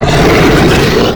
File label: zinogre growl